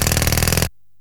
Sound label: jackhammer; wob2 demo